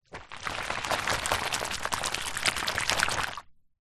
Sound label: household bowl foamy bubbly water swish hand movements